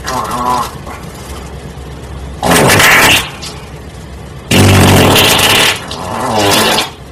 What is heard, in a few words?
nuclear fart